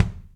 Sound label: Kick Felt Beater; Hybrid Kit; Drum Hits; Drumdrops Recording Hybrid Kit